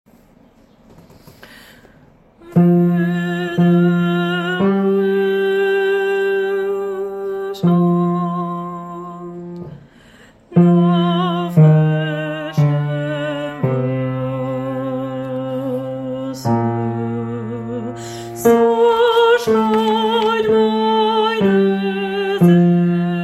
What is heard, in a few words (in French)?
partie basses; Psaume 2 premieres pages